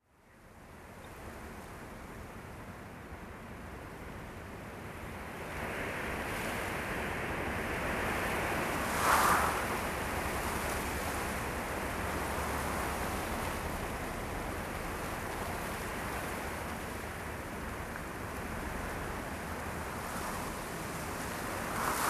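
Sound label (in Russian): ветер по листьям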